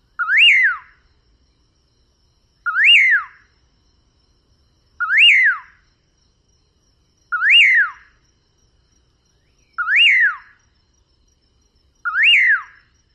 songs; TX

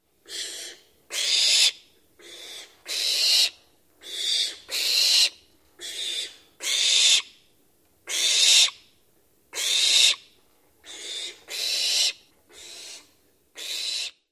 screams of young

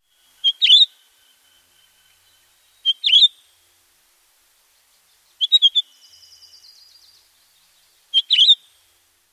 calls; AK

